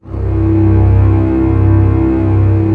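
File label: strings C0 FF; Sound On Sound - Peter Siedleczek's Orchestra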